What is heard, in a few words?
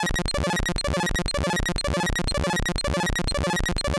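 Alchemy LFO Random 16 Step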